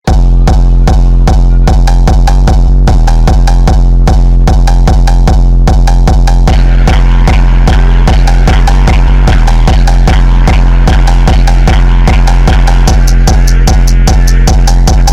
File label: Phonk Drift